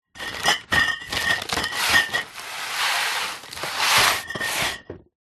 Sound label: bottles beer carton celephane wrapped movement; foley